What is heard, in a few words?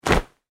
Foley Towel Snap; Snaps; Objects